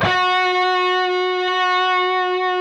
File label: PRS G, Guitars, LEAD; Spectrasonics; Hans Zimmer Guitars VOL-1